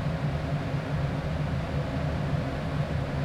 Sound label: bank air conditioner, city; soundscapes